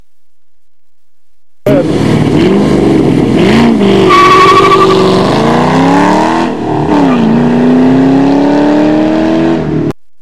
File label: Mustang From Stop